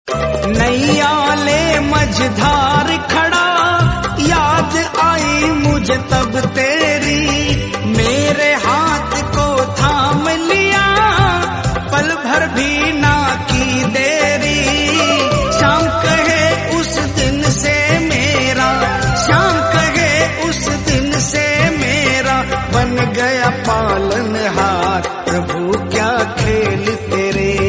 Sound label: Bhakti Ringtone